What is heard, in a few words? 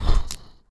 fire